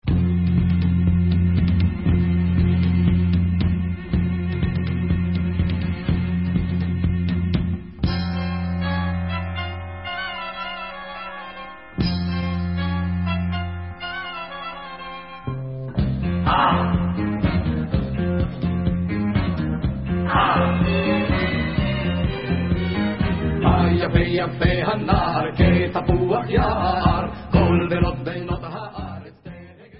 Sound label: Debka, Instrumental